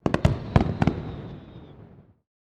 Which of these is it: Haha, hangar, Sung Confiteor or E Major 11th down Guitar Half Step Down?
hangar